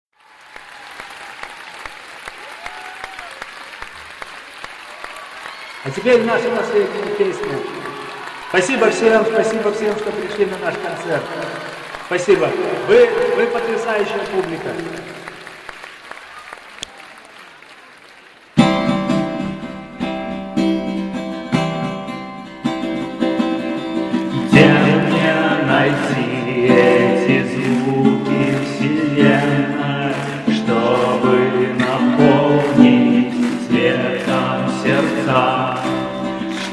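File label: koncert na stadione, concert at stadium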